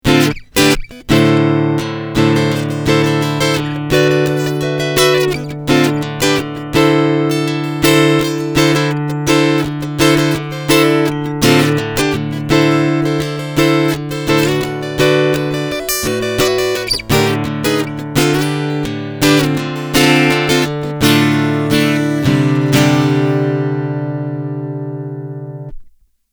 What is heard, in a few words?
Strumming EQ Out